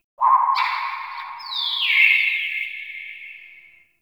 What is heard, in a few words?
Swamp Tropical Bird; Ambience; Midrath Island